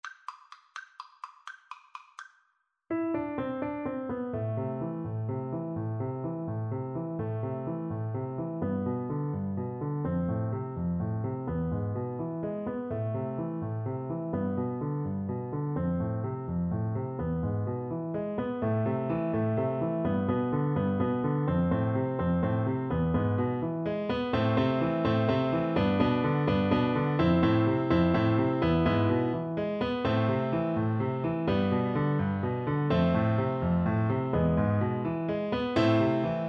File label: spanish violin piano